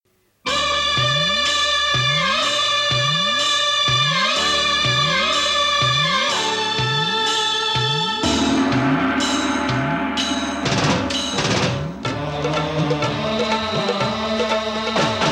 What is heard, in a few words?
Music Instrumental; bollywood; Jab Jab Pyar Hua (1992)